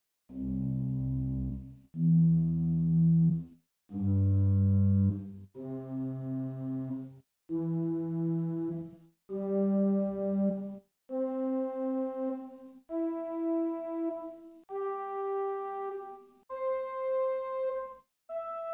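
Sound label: Swell Gambe 8 + Celeste 8 arpeggio, organ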